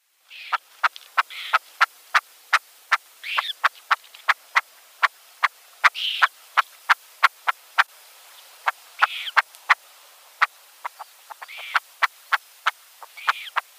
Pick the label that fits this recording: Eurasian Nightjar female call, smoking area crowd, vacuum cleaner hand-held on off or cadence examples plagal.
Eurasian Nightjar female call